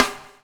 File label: BUBL; Spectrasonics - Backbeat